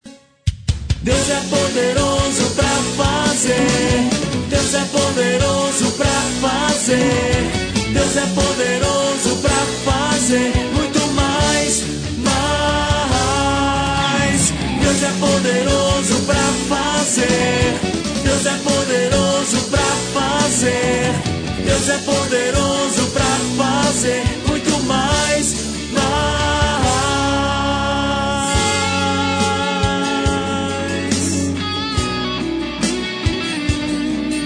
coro

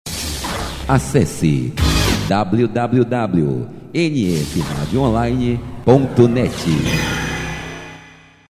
vinheta radio online

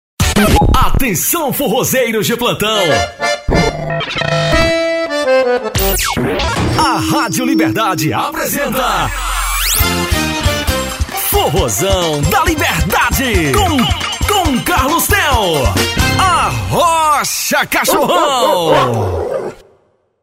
vinheta forrozão

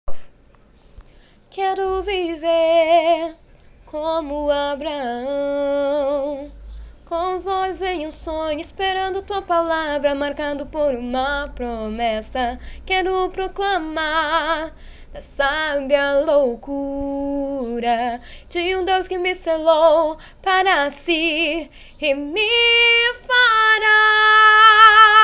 so voz

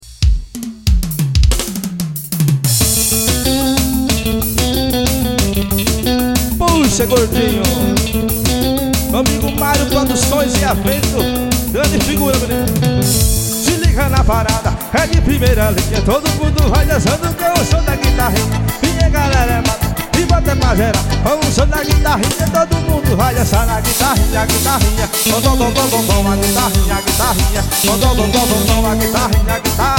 som da guitarrinha

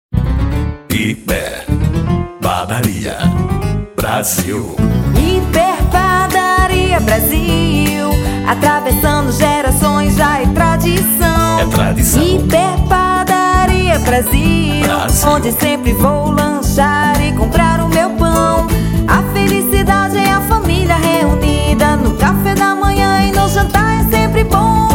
jingle hiper padaria brasil

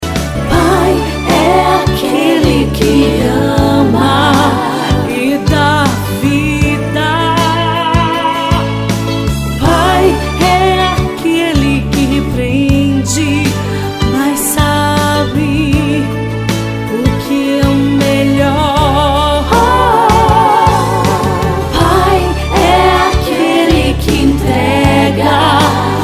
musica obrigada pai

